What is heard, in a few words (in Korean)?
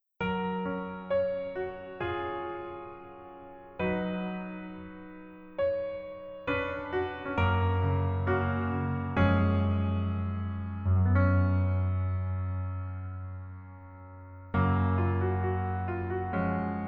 Piano ver, 멜로디MR, -1키